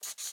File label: Sketch Env Write; Maze